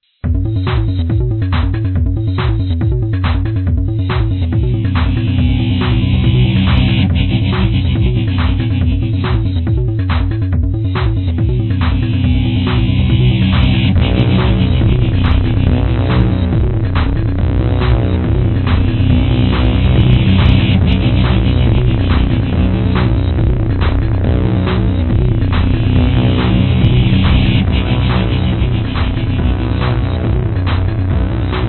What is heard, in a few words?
techno; My lame attempt at a techno piece, made for a friend's video project.